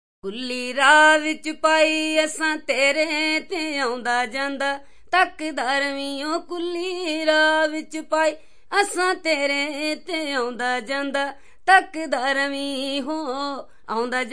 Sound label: deep india, Indian Singing; VOCALS and SPOKEN WORD